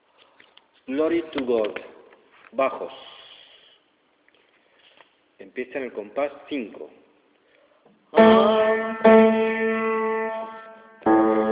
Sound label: choral baj; Chorus 15: Glory to God in the highest